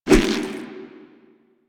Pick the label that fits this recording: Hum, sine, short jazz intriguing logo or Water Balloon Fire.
Water Balloon Fire